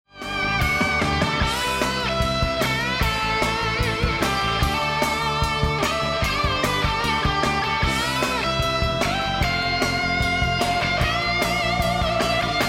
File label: won round; music kits custom